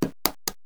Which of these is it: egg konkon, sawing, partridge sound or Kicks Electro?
egg konkon